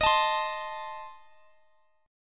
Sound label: A Diminished Eb